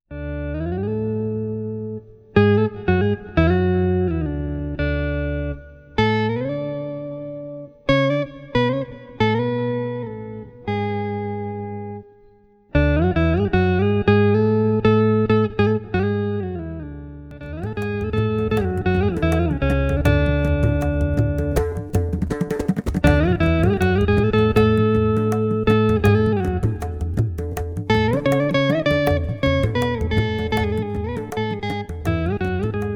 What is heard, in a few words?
KALAYANI RAGA